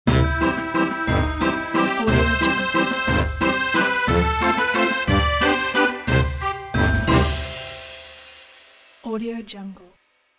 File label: short circus logo